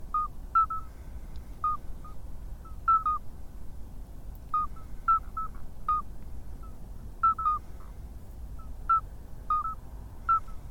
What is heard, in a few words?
Alytes